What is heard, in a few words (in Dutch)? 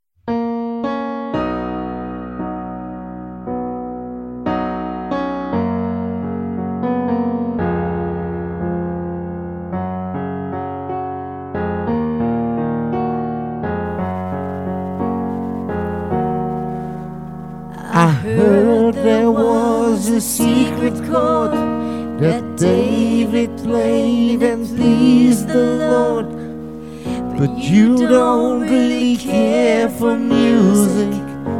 Halleluja alten